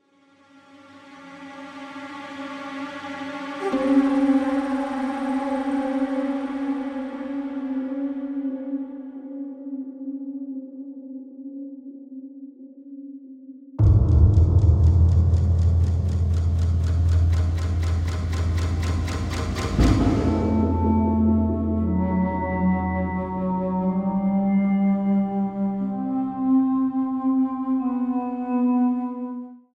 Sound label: Crash Landing; Planet of the Apes